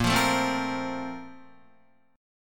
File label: Bb Major 7th Suspended 2nd, Guitar